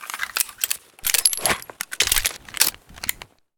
taurus reload empty

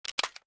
inv detach addon; STALKER; IWP

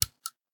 shell 12ga sand; STALKER; IWP

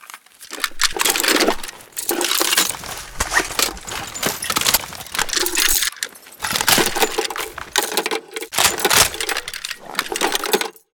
m249 reload empty, weapons

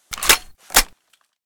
toz34 misfire

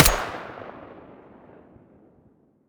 muzzle mono, weapons; fort

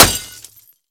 glass, bullet collide; STALKER; IWP

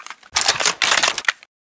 m700 misfire, weapons

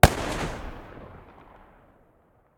muzzle protecta mono far, weapons

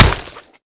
bullet hit, bullet collide; STALKER; IWP

